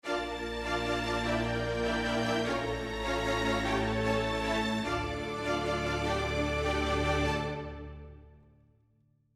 Str Lush Verb

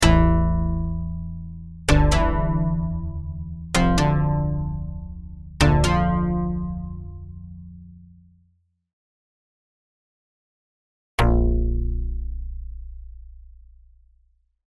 Synth Riff No Delay; Dexter

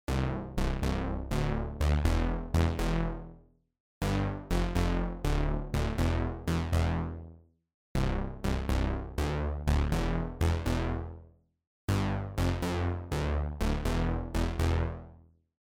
Bass

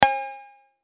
Cow Original

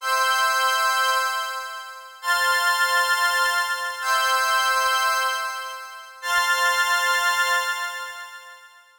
Juno-106 Soft High Pad

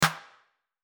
Layered clap snare old school tuned clap

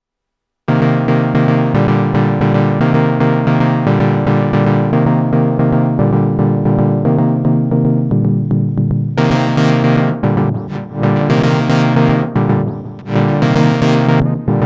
volca motion sequencing; Volca Bass

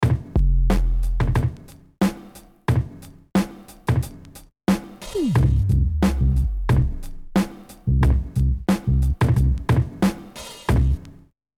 Battery 4 Tape Saturation

